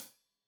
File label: Hat Loose, Hi-Hat, Cymbals Stereo; Deeply Sampled Drums